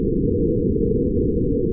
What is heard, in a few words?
Haptic Rewind Tape Loop